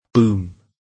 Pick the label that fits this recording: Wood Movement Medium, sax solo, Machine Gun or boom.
boom